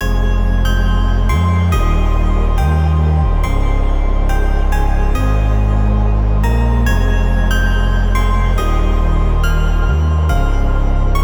future garage loop; lead arp chord melody

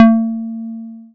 Bass SH-101

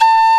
MANDOLIN

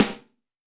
Hip-Hop Kit Snare; Roland Juno-D

